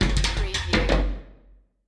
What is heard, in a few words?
Musical